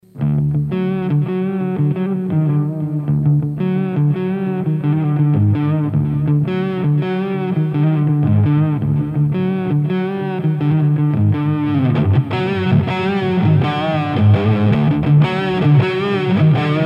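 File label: Dist 15 neck single; JFET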